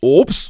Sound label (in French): ouh